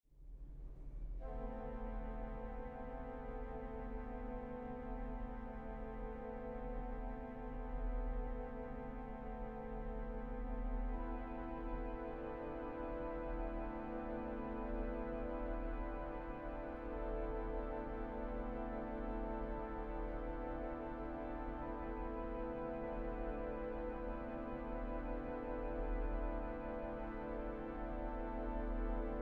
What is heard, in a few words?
ambience nocturnal; James Michael Stevens; Ambience Nocturnal (2024); SMP Press